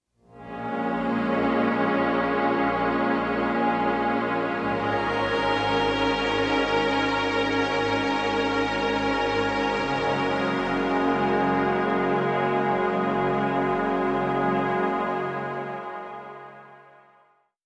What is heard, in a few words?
Big Chords; Beds